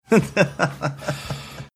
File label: laughing at his own joke